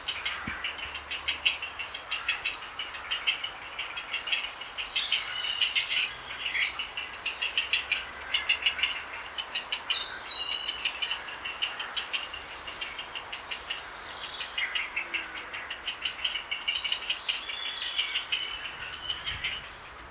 bedelroep blauwe reiger

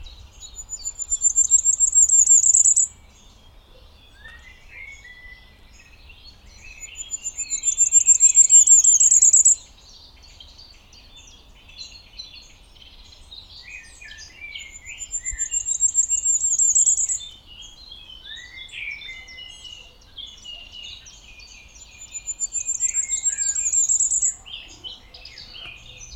vuurgoudhaan zang

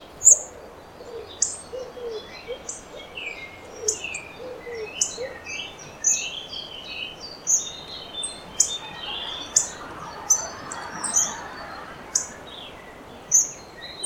appelvink zang

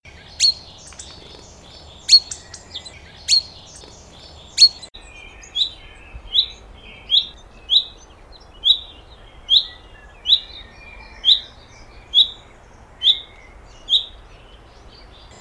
vink roep

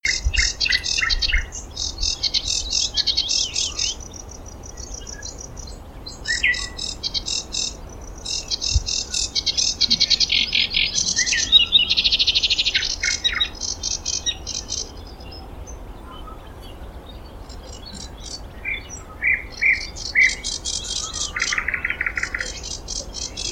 noordse nachtegaal zang

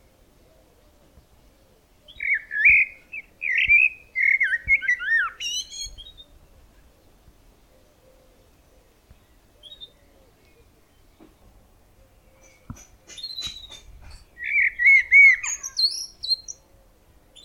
merel zang; dudeljoo

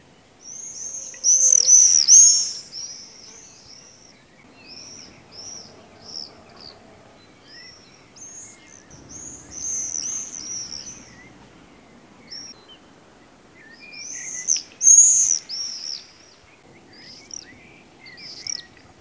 gierzwaluw zang

